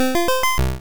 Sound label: Fly Up; sfx; attack moves; pokemon; gen 1; rby